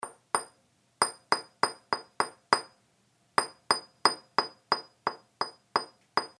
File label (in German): Metall auf Metall klopfen